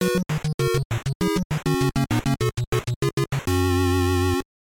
Win Fanfare; super spike v ball

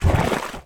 Ice Fields, geyser attack

